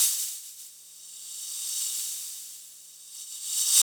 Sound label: EQ Perc, Percussion Mix